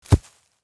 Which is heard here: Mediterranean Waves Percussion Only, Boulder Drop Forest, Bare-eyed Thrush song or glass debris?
Boulder Drop Forest